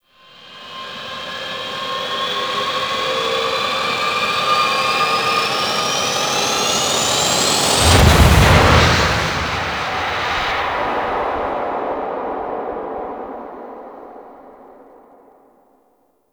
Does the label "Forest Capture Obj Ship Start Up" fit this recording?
Yes